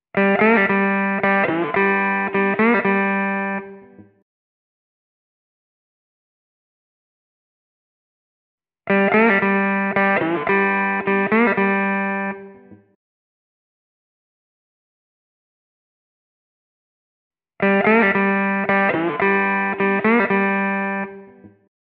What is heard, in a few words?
Slide Guitar 110 BPM In G